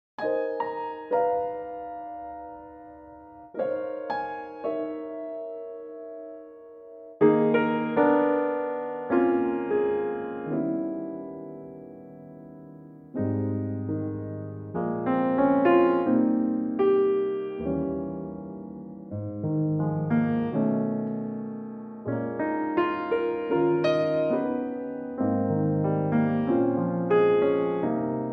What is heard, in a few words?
Ab piano